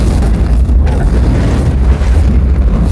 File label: flamethrower loop